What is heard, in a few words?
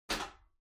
Ceiling Light (Far Pull)